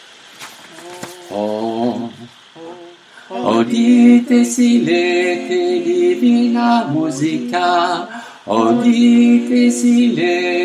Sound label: basses, chorale; Audite silete